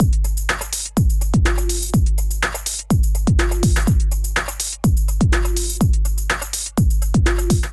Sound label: Beat 124 bpm; Ableton; Factory Packs; Skitter and Step; Mango